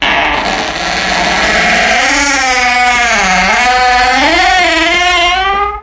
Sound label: Door, Sms Sound Effect